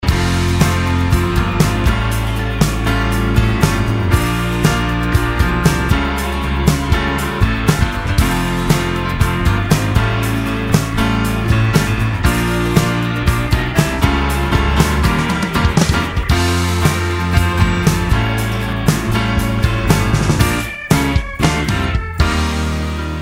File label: Ringtone Instrumental